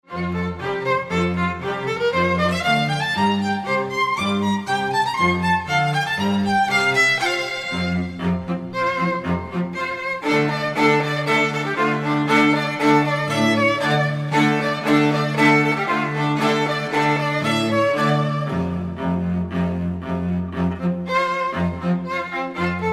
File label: jig